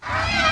frilldora damage

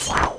knight of windstorm attack

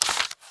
turn page

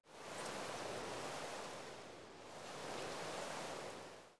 reed

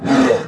attask; anubis

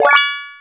spell enchanting

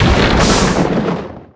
acidified zone ground

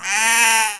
goat damage

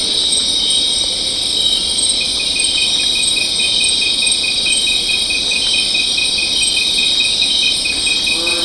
bug

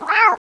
baby leopard die

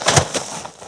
goblin king die

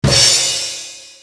phantom child attack

